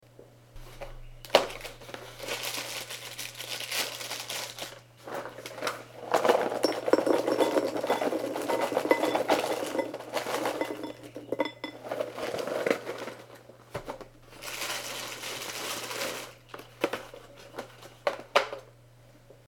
cereal shredded wheat

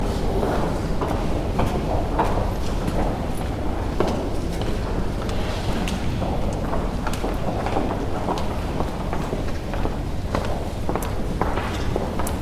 church walla and people walking

